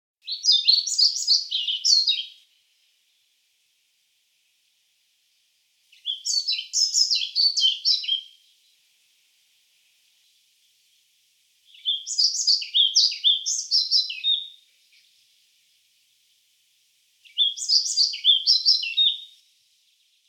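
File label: muholovka pestrushka golos, pied flycatcher voice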